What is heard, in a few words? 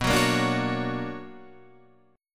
B Minor Major 9th; BmM9 strummed; JGuitar